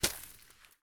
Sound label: Snowball Hit